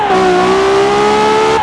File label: second, vehicles; gmod; tdmcars; fer f12